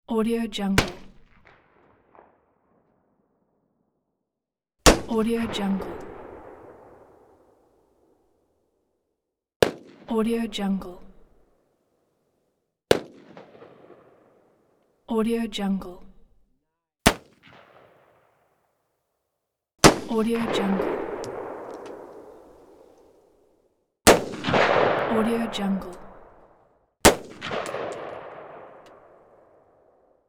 sniper rifle gun shot single

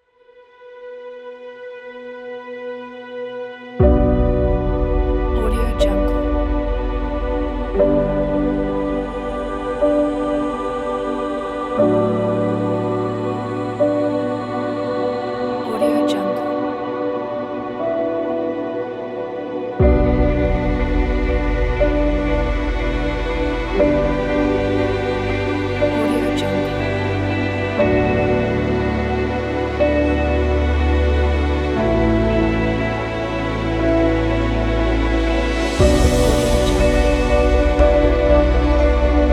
emotional trailer pack; alivetunes

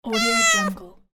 cat meow

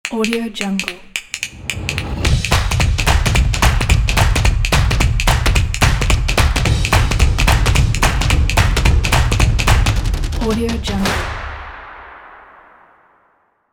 logo drum

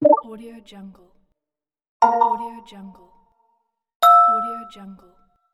notification

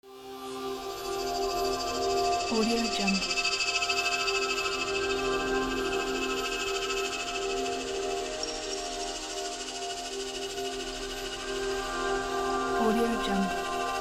soundscape ambient

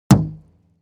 object metal impact deep dull

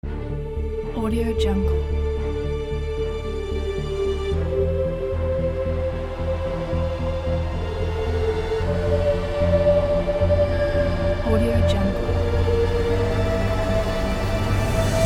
powerful emotional epic trailer; krzysztofkurkowski

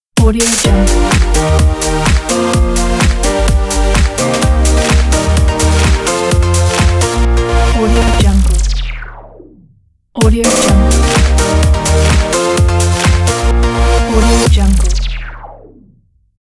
house jingle

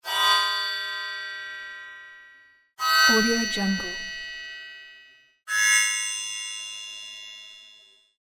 magic wand spell effect

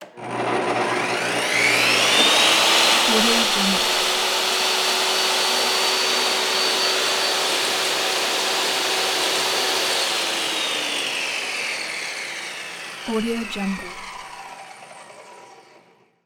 table saw run; prosoundfx

